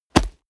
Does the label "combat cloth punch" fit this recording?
Yes